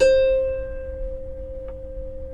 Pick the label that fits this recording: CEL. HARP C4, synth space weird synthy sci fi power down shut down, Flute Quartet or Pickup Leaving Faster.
CEL. HARP C4